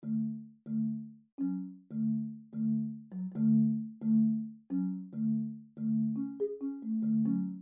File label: marimba soft; Tuned Percussion